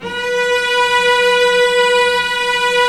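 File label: strings, mf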